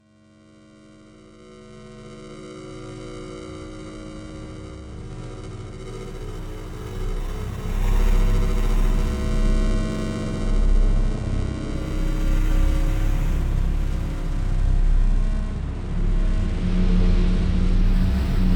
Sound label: Title Stinger